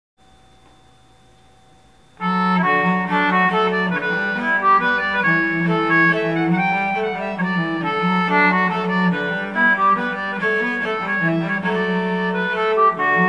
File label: Swedish, concertina